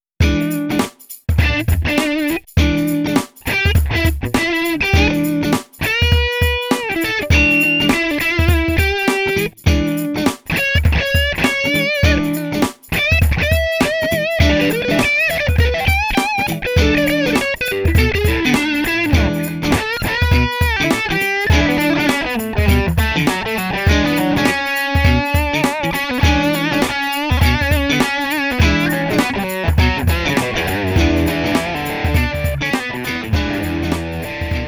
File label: funk